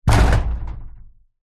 impact metal corrugated loose

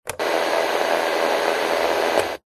radio switch on static switch off stereo am; zapsplat

